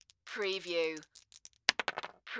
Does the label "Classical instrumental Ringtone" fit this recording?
No